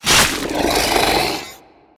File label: Voices Mob death; guns n zombies 2014 pc